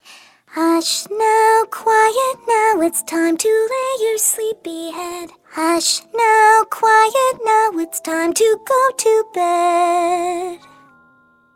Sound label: Vocals 96BPM